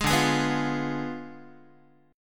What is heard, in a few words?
D Diminished 7th D down Guitar; strummed